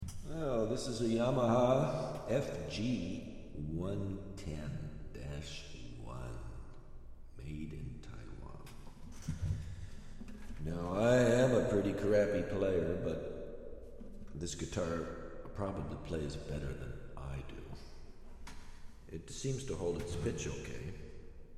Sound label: guitar